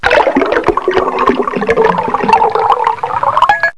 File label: Water, 自然, 水声; 特酷声音素材库